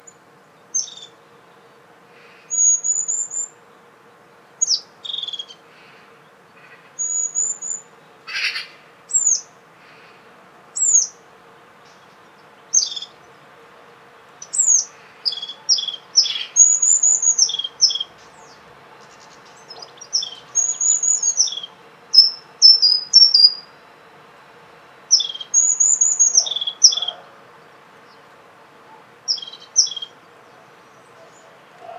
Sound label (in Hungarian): bubus cinege hangja